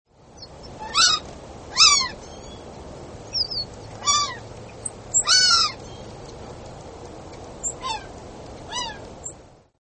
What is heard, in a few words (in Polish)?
błotniak zbożowy